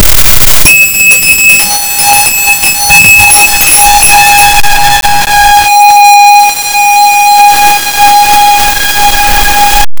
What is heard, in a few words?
soft ceremonial inca empi; culture